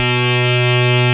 RAVE BEEP; Best Service Alpha Dance vol.2

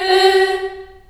G3 CHS EH, CHILD EHS, Choir; USB Soundscan vol.28; Choir Acoustic & Synth; AKAI

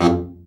BRA Bari, SAX; Best Service Gigapack 1; BR SAX 2

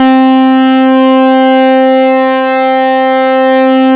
leads; Masterbits; Vintage Voltage

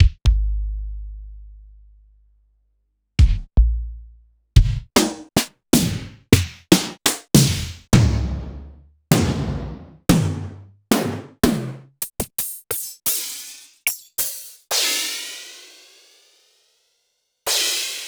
Korg 01W Mega Drums

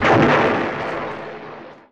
EXPLOS; Best Service; Advanced Mega Effects

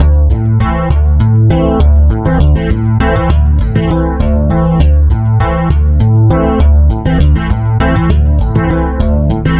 Stick Loop, Stick Bass; Sonic Foundry (Sony Creative Software); Leo Cavallo Bass Taster

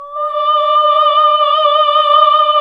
OHH D, Nuns Ooohh; Sound & Vision - Gigapack II

